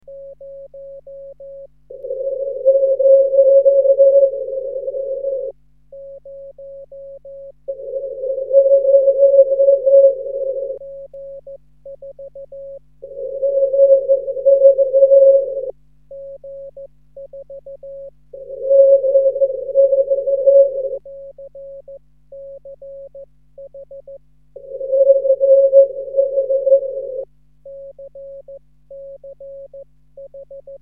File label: echotest cw; 6cm; G4CCH